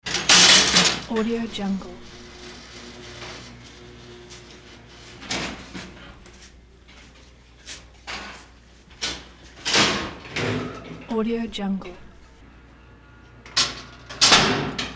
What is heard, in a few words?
electric cell door opens and closes